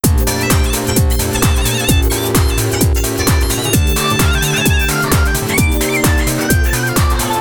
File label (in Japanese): 太いサウンドと効果的なリバーブ; ニコニコ動画